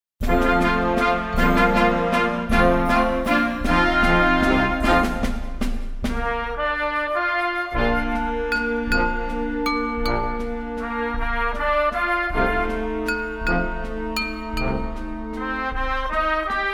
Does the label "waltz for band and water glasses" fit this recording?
Yes